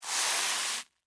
Scrape Cereal Short, Texture, Scrape, Short, Cereal, Corn, Flakes; BlastWave